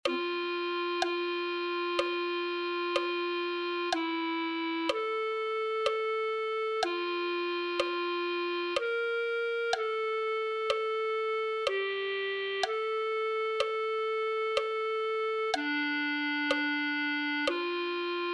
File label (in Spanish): clarinete